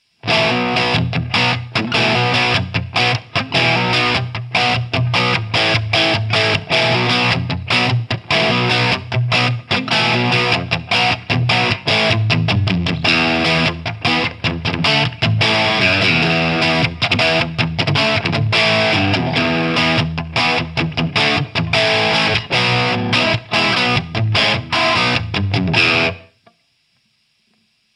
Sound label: Gretsch Streamliner Crunch